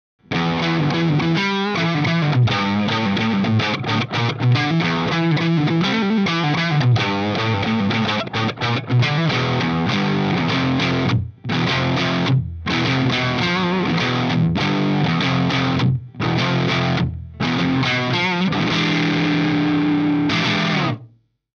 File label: FUZZ